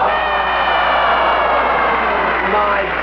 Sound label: scream